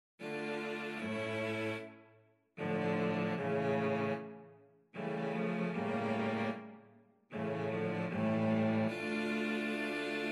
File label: cello quartet; four seasons